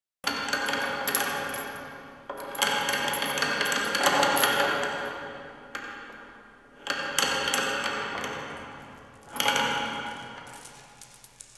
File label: Dungeon Island Chains, Ambience; Warframe; Duviri